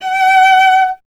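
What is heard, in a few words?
strings violoncello F#; Miroslav Vitous; Solo Instruments VOL-1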